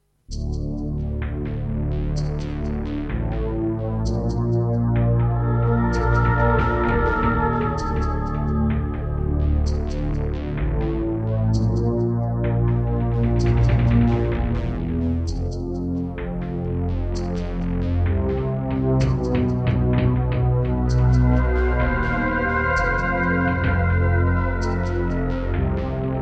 Creative Sound Blaster AWE64 Gold; Descent II

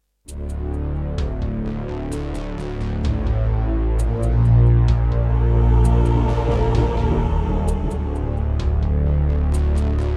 Roland SC-88 Pro; Descent II - Briefing

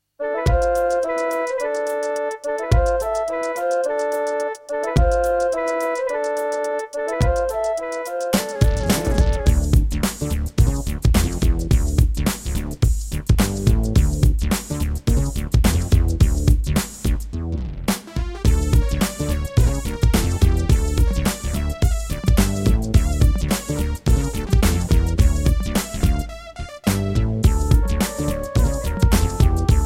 NEC XR385 (DB60XG Clone) GSMODE; Lost Vikings

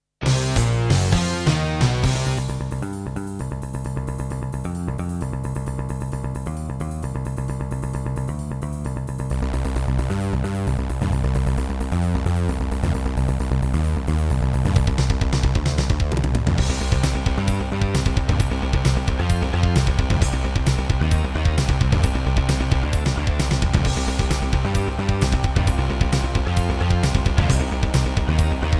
Alesis NanoSynth; sigil doom episode 5

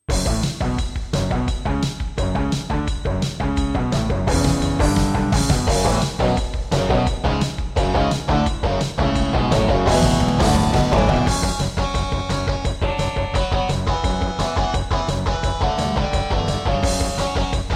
Turtle Beach Rio; Duke Nukem 3D

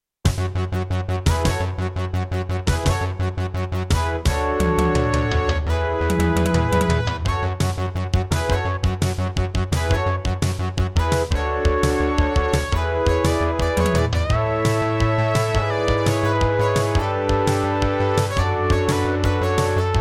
Intro, Roland SC-55mkII MT32 Mode; 4D Sports Boxing

